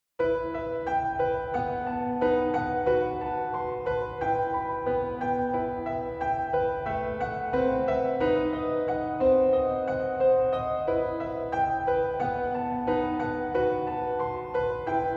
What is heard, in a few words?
interlude instrumental